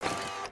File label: Fidget Arm; emotes; Overbuild